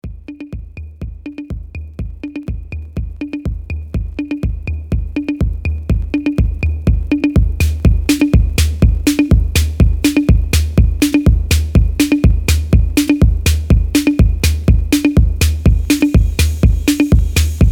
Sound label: Bonus Drums